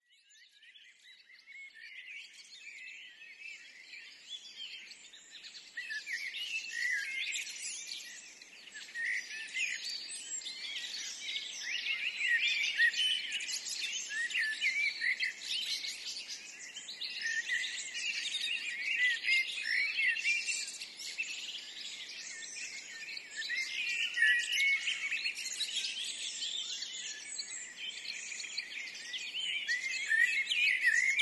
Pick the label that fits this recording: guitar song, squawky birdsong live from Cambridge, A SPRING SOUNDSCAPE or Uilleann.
A SPRING SOUNDSCAPE